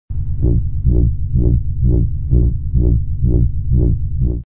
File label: zapper sound arrow